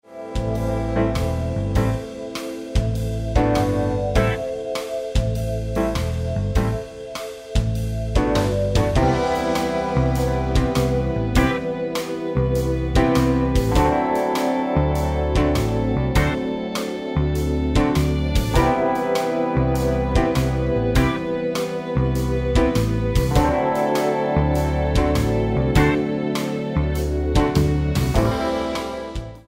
Blues Backing Track in Am

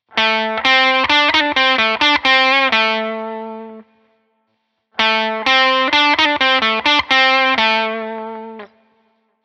Blues Lick

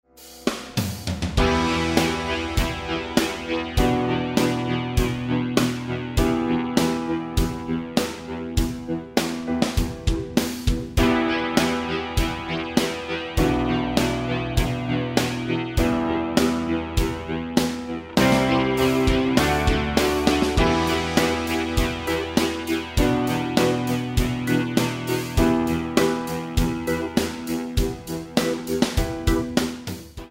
Bass 80s Rock Jam

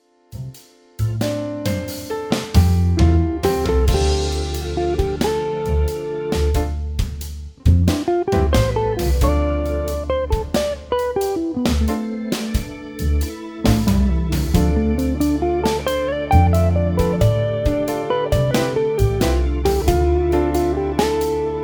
dorian pentatonic example solo, guitar

